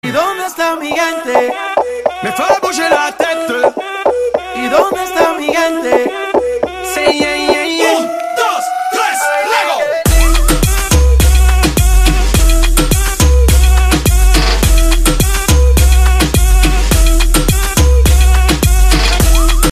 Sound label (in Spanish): reggaeton